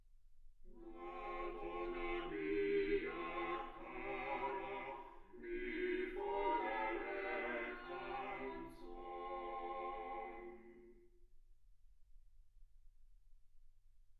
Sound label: quartet